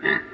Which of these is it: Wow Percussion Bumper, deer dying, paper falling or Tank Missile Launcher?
deer dying